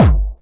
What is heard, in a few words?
hard bass drumz